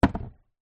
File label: spice jar glass small set down on wood shelf; food; zapsplat